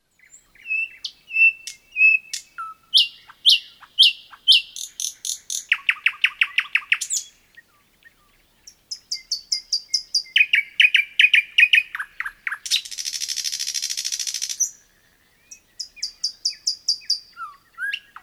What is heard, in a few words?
bird sounds; spring